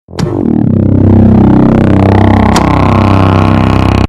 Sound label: wub tremble